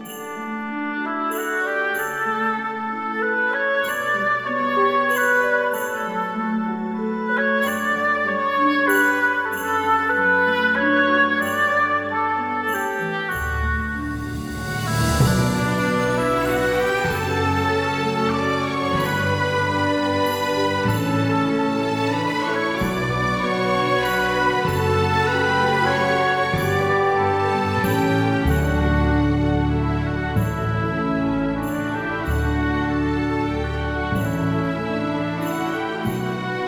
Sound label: Gameover; the legend of santa